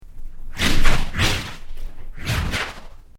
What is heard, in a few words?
Created Creature Medium Exclamaition, Alien Sound Effects